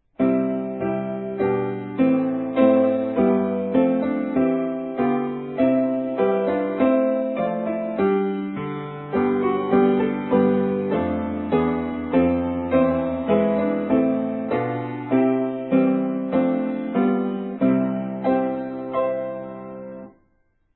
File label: westminster, hymns